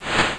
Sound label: Shwapp